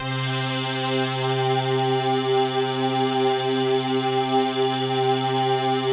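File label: pulse; Masterbits; Vintage Voltage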